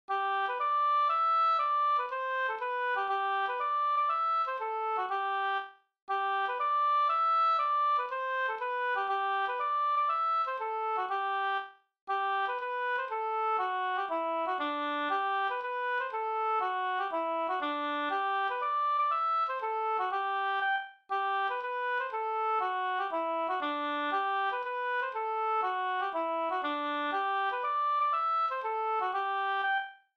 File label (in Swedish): polska